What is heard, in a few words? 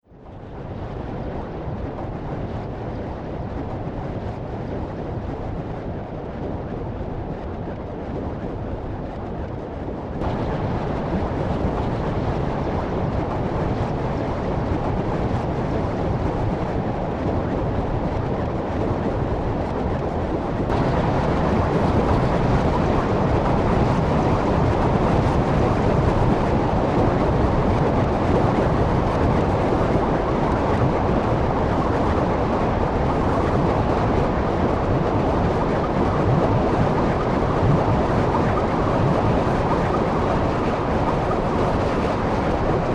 Soundscape Fast Lava Noise, scary soundscape sound effects